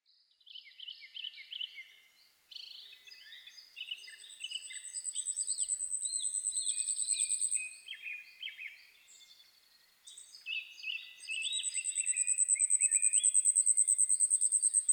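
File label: Asian stubtail, Urosphena squameicepus; 富山県富山市山田鍋谷 alt=630m; Rec: EDIROL R-09 Mic: Sound Professionals SP-TFB-2; まだ未完成のさえずり 他の自然音：クロツグミ、トラツグミ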